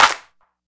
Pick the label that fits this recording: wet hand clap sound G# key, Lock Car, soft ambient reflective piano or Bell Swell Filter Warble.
wet hand clap sound G# key